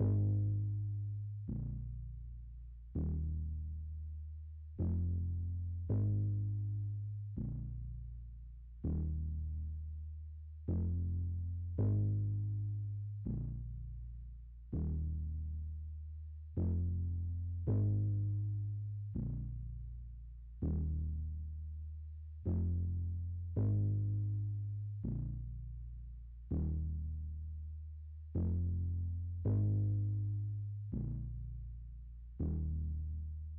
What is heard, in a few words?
163 BPM D# Min Bass; Melody; Loop Stems; Cymatics; 2022 Melody Collection Platinum Expansion; Homebound